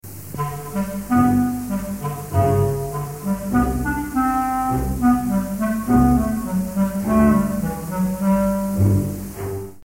La clarinette

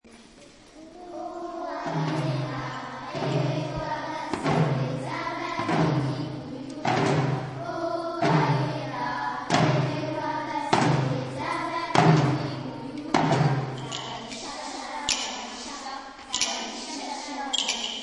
Chant

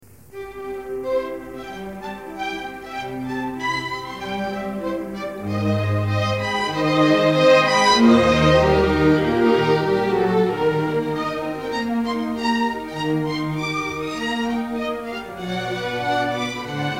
Les cordes

